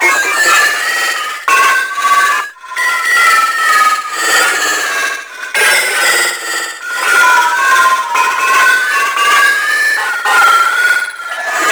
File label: Rescue Captive Trapped Loop; Tau; Twelve Minute War; Stealth Rescue